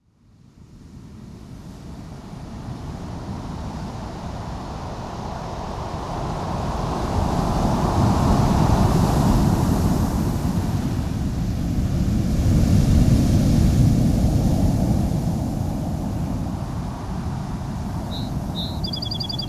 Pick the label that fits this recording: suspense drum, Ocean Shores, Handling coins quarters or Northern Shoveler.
Ocean Shores